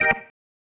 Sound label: Stab One Shots, Organ